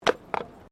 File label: Tram Horse Drawn Horse Step Pavement; Horse drawn tramcar; Carriages; Vehicles